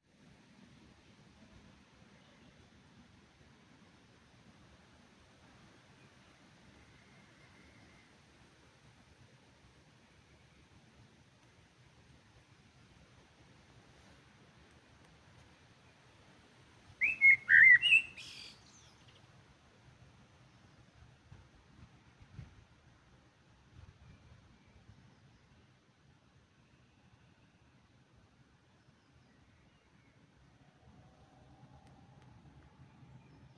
Dawn Chorus, In einem Moment machte eine Amsel sich lautstark bemerkbar (ab ca. 15 Sekunden); Es war aber immer noch recht windig; Der Dawn Chorus 2025 fand wie jedes Jahr im Mai statt

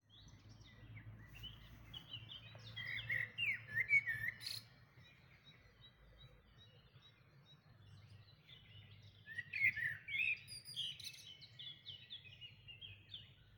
Dawn Chorus, die Amsel sang nun eindeutig lauter; Amsel in Berlin; Sie saß über mir auf einem Baum; Der Dawn Chorus 2025 fand wie jedes Jahr im Mai statt

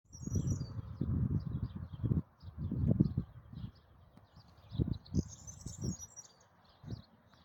Grauammer; Insel Poel; Mecklenburg-Vorpommern

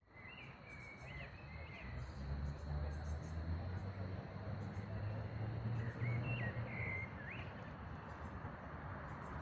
Kohlmeise Amsel; Stunde der Gartenvoegel

